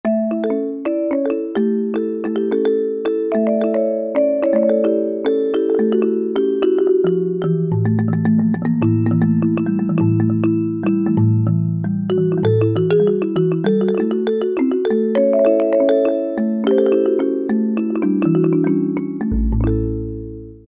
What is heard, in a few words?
Pure Balafon; World; Roland SR-JV80-05